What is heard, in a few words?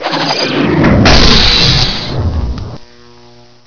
gunner die forward